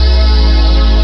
Drumz Bass Pad; Jungle; Pads; Blu Mar Ten; Jungle - 1989 to 1999

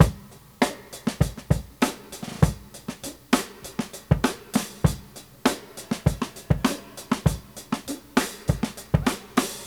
Breakbeats

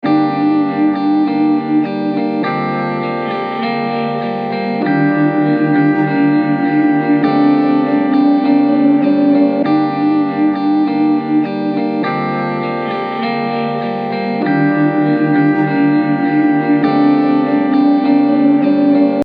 100 Gm; CRATES & BREAKS VOL.1 - BEEJ

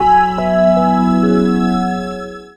Stepper Keys; Jungle; Blu Mar Ten - Jungle Jungle - 1989 to 1999 Samplepack

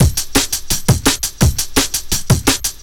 Warhead Break 2, Breaks, Jungle; Blu Mar Ten - Jungle Jungle - 1989 to 1999 Samplepack

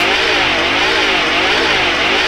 Maintain Rotator; Jungle; Blu Mar Ten - Jungle Jungle - 1989 to 1999 Samplepack

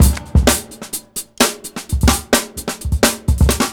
Breakbeats

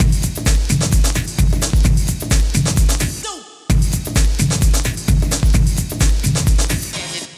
Control Stutter Break, 7A, Jungle Breaks; Blu Mar Ten - Jungle Jungle - 1989 to 1999 Samplepack

